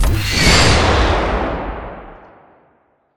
Missile Shot; Sample Audio Pack; Free Pack

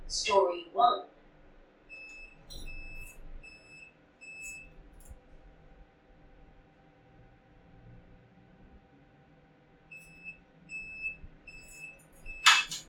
effect Lift End; Running Late Game

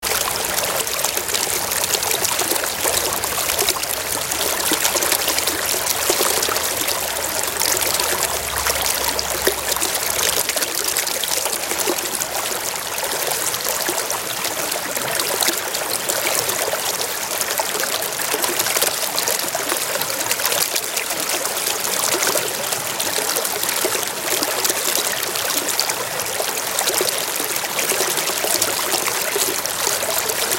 running stream water sound; pond; school and park pond

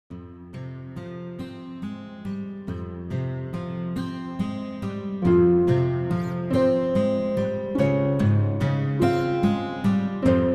Abandoned Village, Fantasy Adventure Music; Short; Free Fantasy Adventure Music Pack

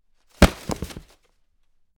Throw Trash

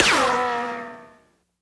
Ricochet, bullets; Ricochets; Weapons of Choice FREE - Komposite Sound

